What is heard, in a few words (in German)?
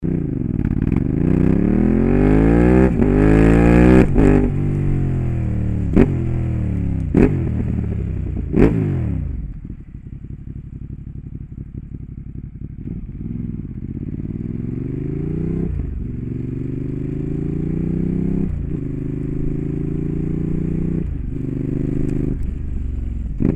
Ducati Monster 1200 NXT ohne DBK; REMUS